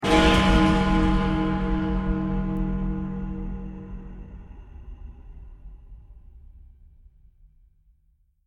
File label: Game Over Sound; The Twins; Android iOS gamerip 2020